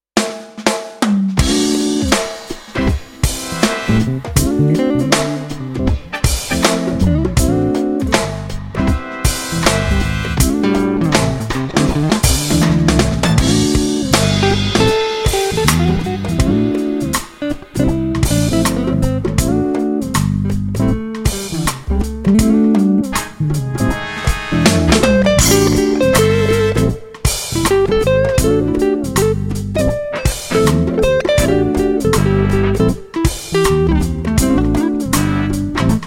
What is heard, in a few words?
Instrumental